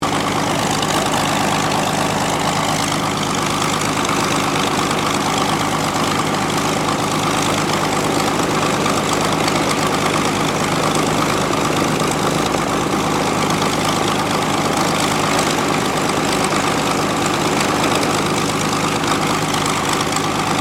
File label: Aircraft P-40 Kittyhawk 1940s idle taxi, WW2 Kittyhawk or Warhawk fighter plane; Airplanes